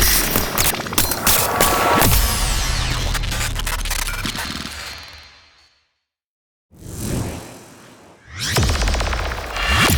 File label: Power Detach; Ballas; Warframe; Cinematics; Apostasy